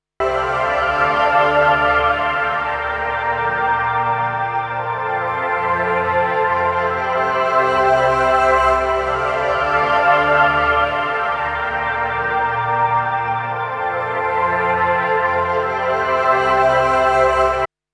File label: Pad 2 Wet